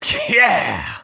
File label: vce relief fall